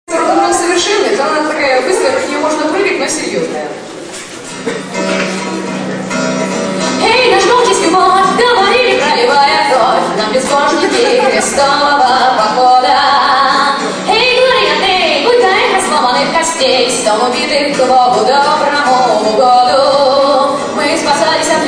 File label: rocknroll